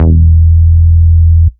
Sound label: Root E, Bass